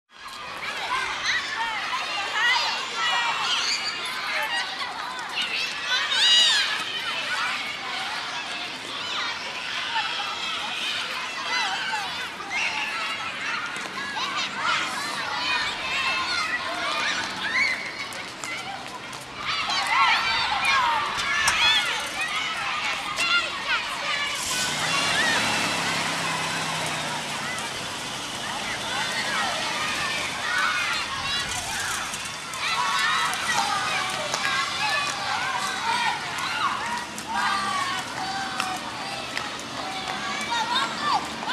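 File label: 8-year-old children playing noisily on the street; Звук играющих на улице детей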